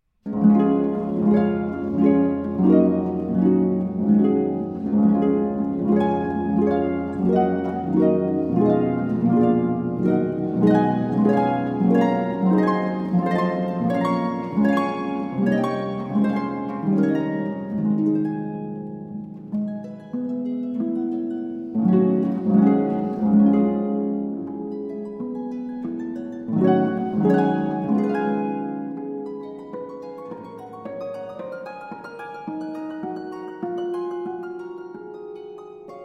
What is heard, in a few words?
Impromptu in D-Flat Major, Op. 86